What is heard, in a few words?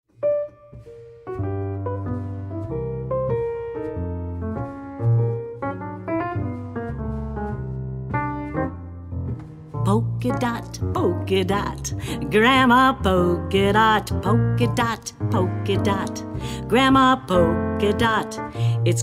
theme song